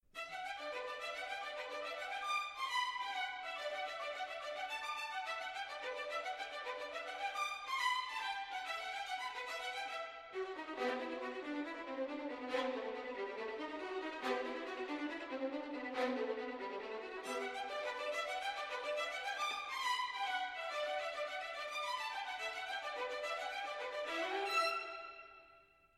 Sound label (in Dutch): violen